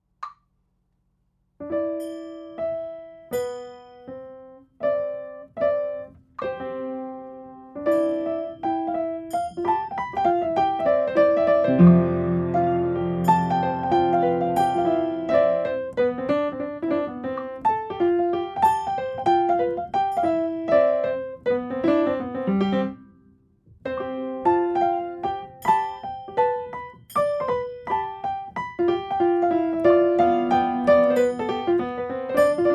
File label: music of burma